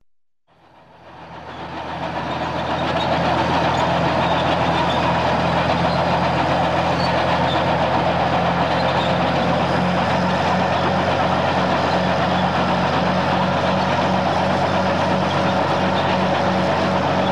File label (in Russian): zvuk tanka v dvizhenii skrip i skrezhet gusenic